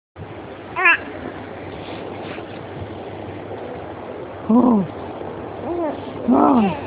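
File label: baby hungry, female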